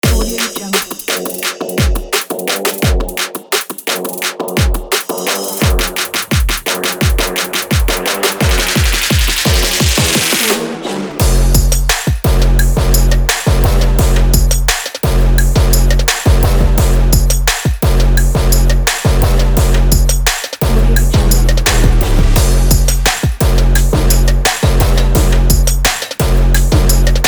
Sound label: this rap